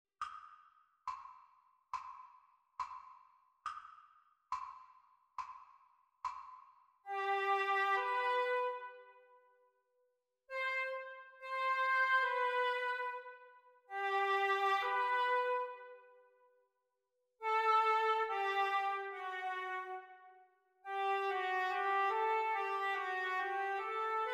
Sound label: oboe duets practice